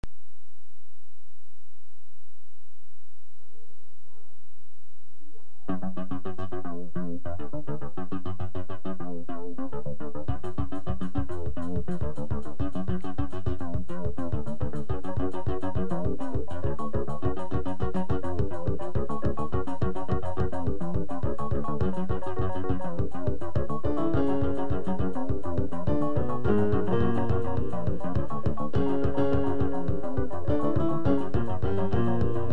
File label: Techno Music In F Sharp Major Garageband